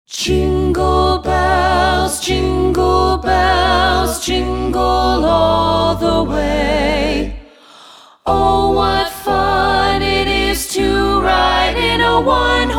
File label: Jingle Bells